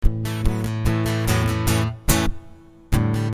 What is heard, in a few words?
Strumming A 146